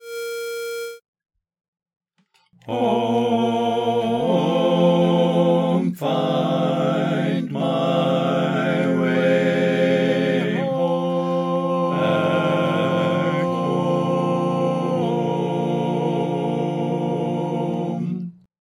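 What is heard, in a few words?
for Bass and Baritone, All Parts, barbershop tags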